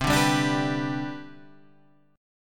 C Major 7th Suspended 2nd, C, down, Guitar, Half Step Down